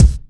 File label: dry bass drum sample c key, bass drum tuned to the C note